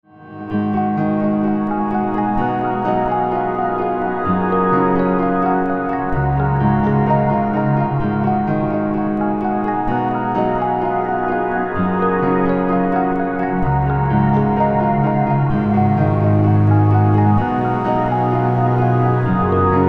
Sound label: Orchestral Armchair Version